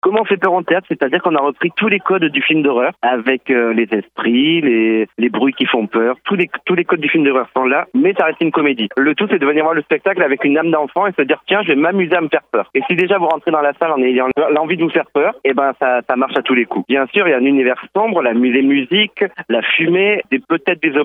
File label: AAAHHH